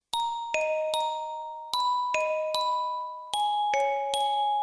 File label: Is Calling Inspirational